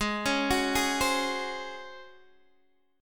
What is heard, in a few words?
Db Major 7th Suspended 4th Ab